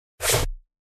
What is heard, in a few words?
lobby footer close